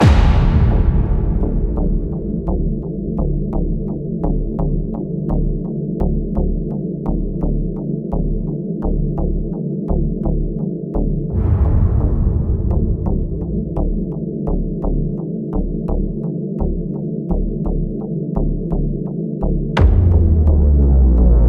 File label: hightech tense theme stutter; Element: Space; PS4